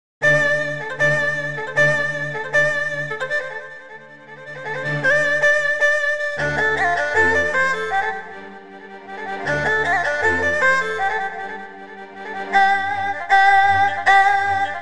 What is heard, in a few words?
erhu, horse race